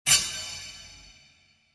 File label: bright sword